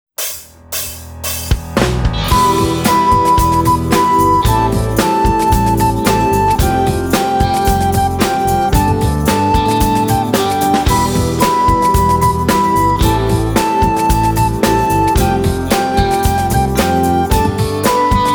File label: recorders